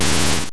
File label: Lightning; MMBNMMOP